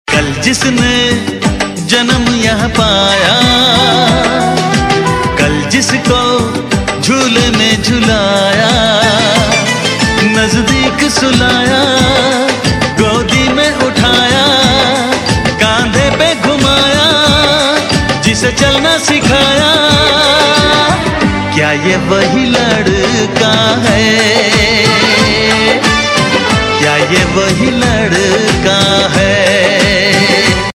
Engagement Tune; Viren Jeevika

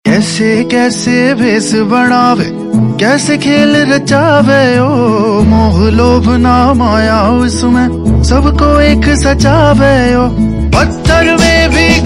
Ringtone

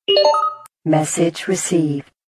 Msg Received

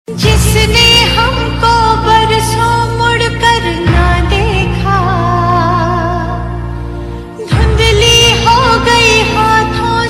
Sad Female

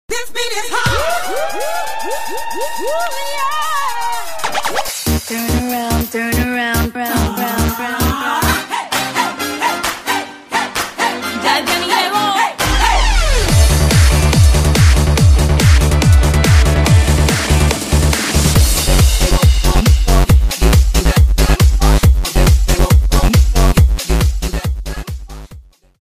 Hot Beat

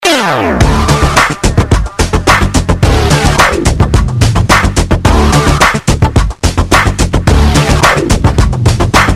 new rock tone; 3D Tones

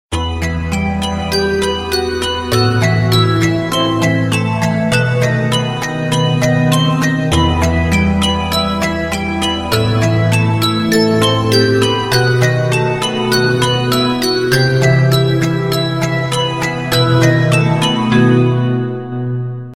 Message Tone

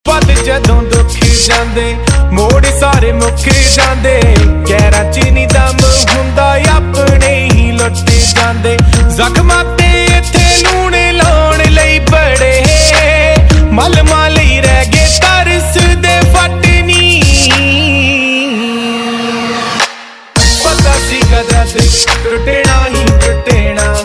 Ringtone, Punjabi

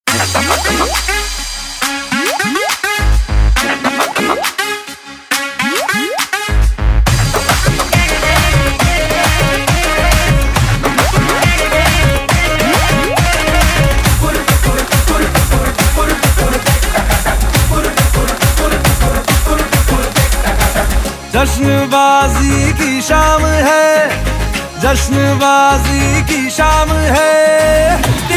Hip Hop and Animation Mix; The Dance Project Season 1 - Episode 2 (2018)

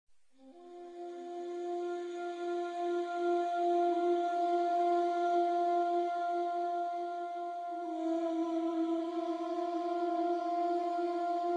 Instrumental; Blue Umbrella; The Blue Umbrella

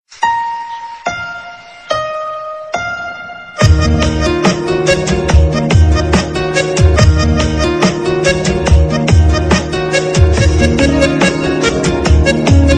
Bg Music; Lg Touch; Tv Advertisement Tones

